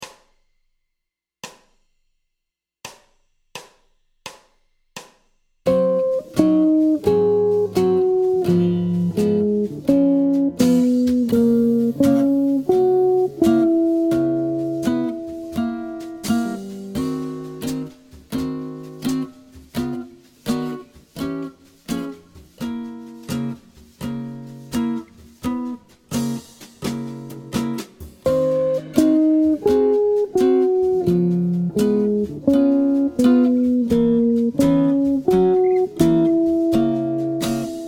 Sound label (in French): arp liaison Ab7 Db7 Gb7 aigu, guitare jazz; ii V7 I∆ majeur; transformations de l arpege de liaison